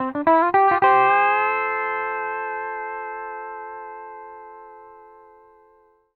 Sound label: 105 R, PD LOOPS